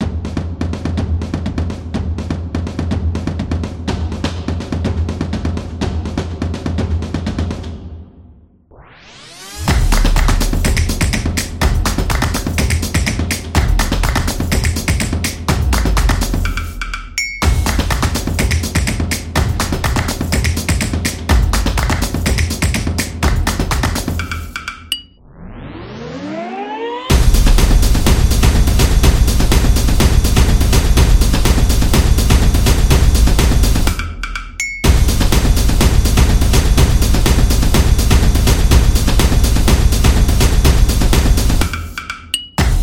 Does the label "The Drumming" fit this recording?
Yes